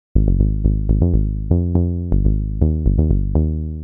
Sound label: 122 BPM Bass Synth D maj C min Tonic G; Pro Bass Synth Studio Kit; MMB